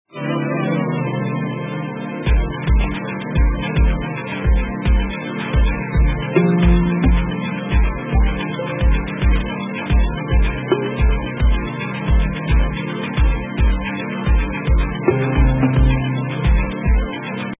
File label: upbeats; adafruit circuitpython bundle; macropad; macropad mp3